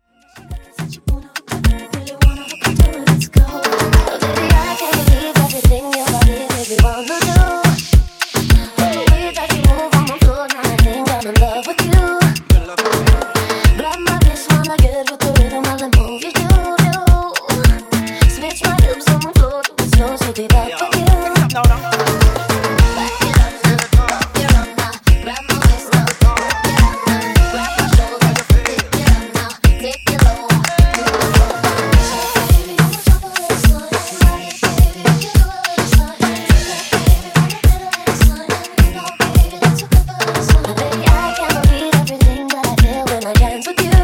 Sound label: Moombah Mixshow 105Bpm Clean; djyan